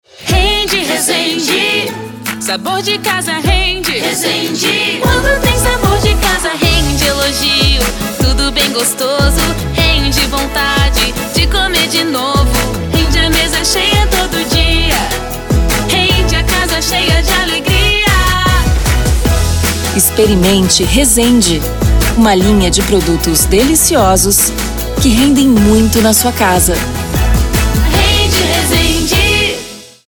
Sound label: Jingle